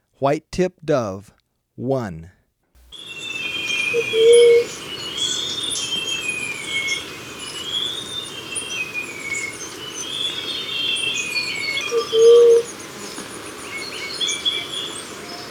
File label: White-Tipped Dove; S. America